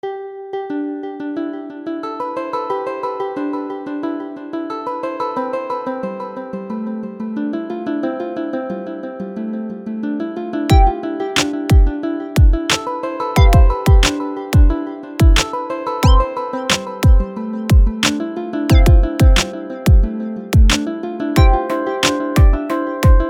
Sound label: Outside the Atmosphere